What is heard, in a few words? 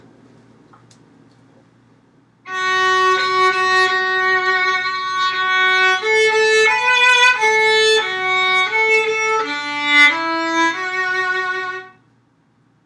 Jingle Bells violin harmony part